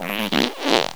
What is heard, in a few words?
fart